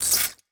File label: Glitch, Electric